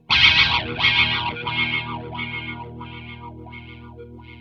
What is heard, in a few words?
ROTARY WA, Distortion Gtr; PlatinumPhattCD2; E-MU Producer Series Vol. 8 – Platinum Phatt